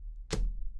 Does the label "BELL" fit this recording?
No